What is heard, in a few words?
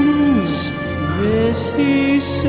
female laugh